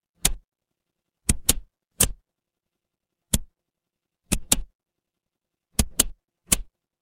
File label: mechanical switch